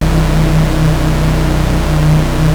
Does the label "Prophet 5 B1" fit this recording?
Yes